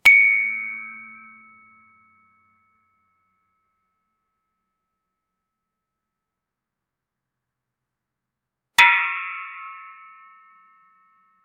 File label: ding dong click bang